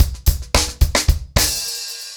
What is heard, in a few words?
Hip Hop 110BPM, acoustic drums drumloops